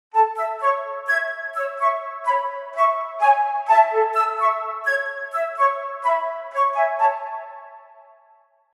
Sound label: Lightly Ringtone, Flute; HTC; Flute 1